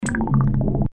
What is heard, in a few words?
sink; fortress